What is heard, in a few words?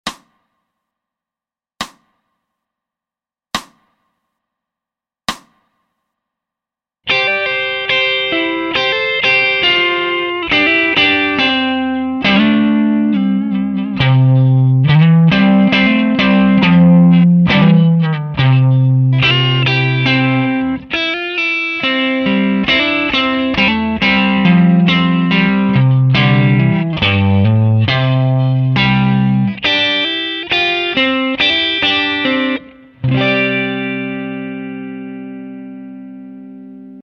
C MAJ Rip (SLOW)